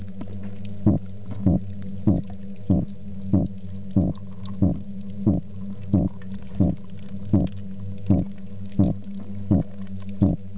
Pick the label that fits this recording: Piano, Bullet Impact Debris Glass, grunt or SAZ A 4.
grunt